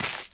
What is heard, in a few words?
Dirt Light Medium, shagov